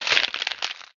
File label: Solidify; Prison Architect